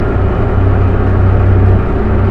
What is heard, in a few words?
generator looped; ambient; jupiter